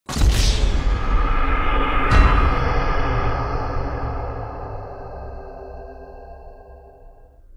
wasted custom sound effect; gta 5; games

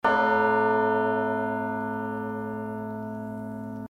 aerodynamic bell

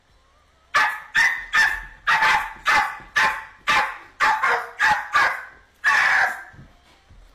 dog puppy barking; memes

